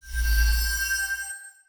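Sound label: sword buzz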